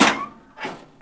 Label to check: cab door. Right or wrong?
Right